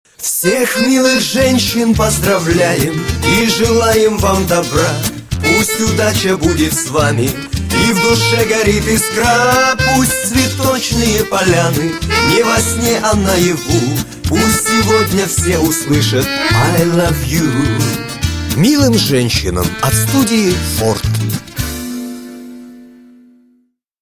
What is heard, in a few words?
fort song 8 march